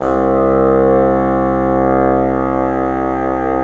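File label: WND BN, wind bassoon; LA Composer vol. 3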